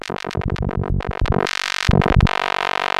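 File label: Sample and Hold Filter, SFX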